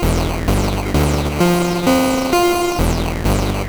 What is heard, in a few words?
Juno-60 Arp, 130BPM; musicradar; bitcrushed; Crushed Loops